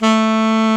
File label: sax alto mp A, Short